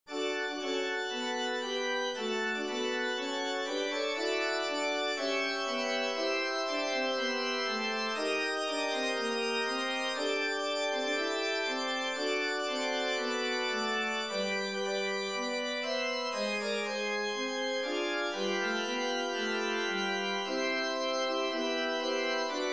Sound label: Anniversary Fanfare